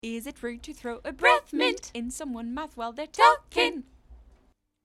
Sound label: Sing this tweet; Bill Murray Mint